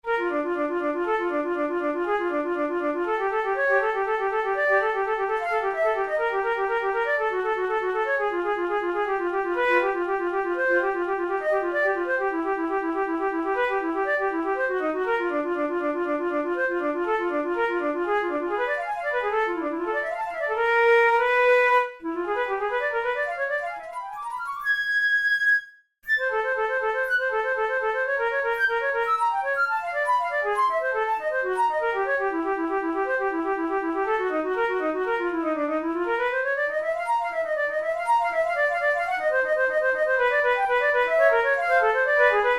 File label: studies for flute solo; karg-elert